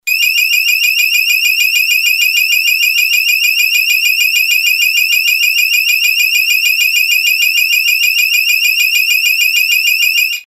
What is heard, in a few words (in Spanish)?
tono